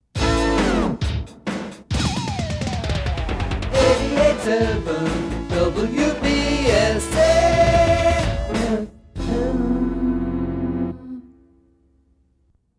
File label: JINGLE Cluster Slow Long